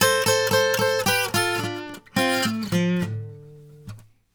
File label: Blues Guitar; ILIO; Fretworks